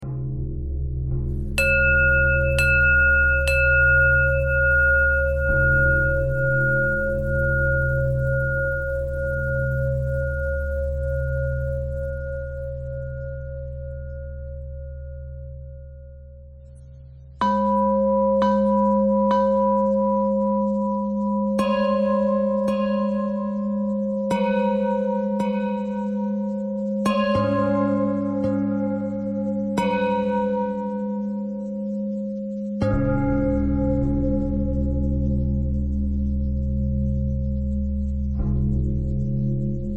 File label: bell thread pentatonic